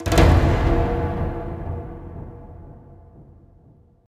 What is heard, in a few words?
combat; backstab; android